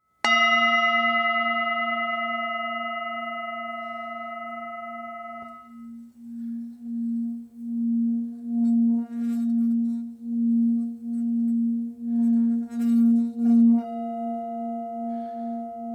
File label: mantra Bb; G18 Estrella